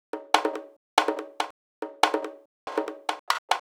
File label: Bongos; PsyTrance Project; Ableton